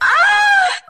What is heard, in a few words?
"ah", female; Voice; ooh ahh